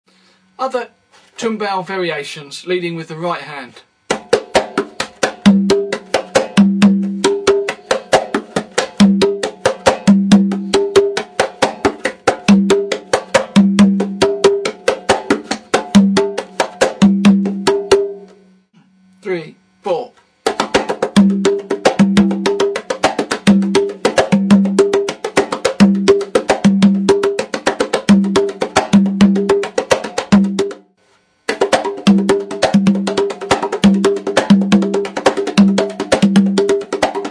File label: tumbao variations, conga